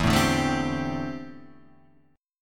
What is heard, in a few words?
D 7th Suspended 2nd Suspended 4th E